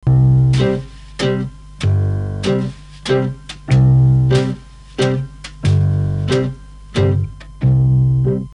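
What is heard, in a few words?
m300 slow waltz, Mellotron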